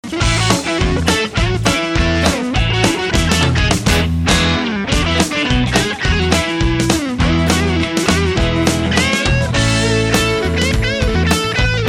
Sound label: GUITAR CHRISTMAS MEDLEY